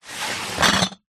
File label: foley bottles glass empty in cardboard box slide wood floor